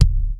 KCK SN, SONIC DRUMS; Greytsounds VOL-1

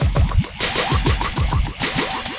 drloop, drumloops, Vinyl Attack; Masterbits

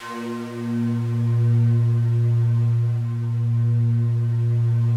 FOG ATTACK, Yamaha VP-1; Music Store; FOGATTACK 2

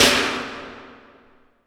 IMP SNR DRUM, Drum, Cinematic Impact

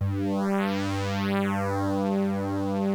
KORG G2, Rare Synthesizer; Hollywood Edge - Giorgio Moroder Rare Synthesizer Collection

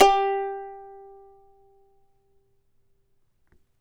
charango G3; Spectrasonics; Hans Zimmer Guitars VOL-2

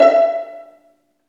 String Vlns Pizz

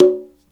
INDIA PERC

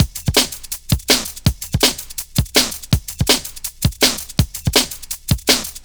Survive, breaks

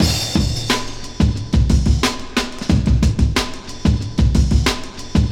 Funk Break, Drum & Bass; Fluid Dynamics

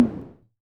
Tom High, Korg KR-55B

